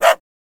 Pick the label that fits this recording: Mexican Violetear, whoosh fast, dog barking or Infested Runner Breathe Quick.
dog barking